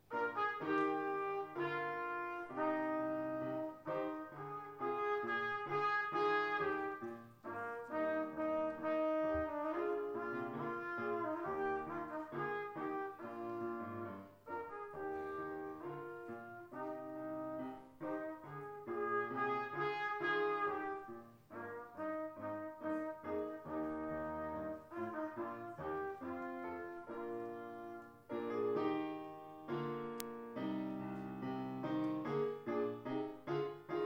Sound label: march bourree, Ortsmusik; ortsmusik ollersdorf